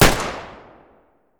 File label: sterling fire, weapons; garrysmod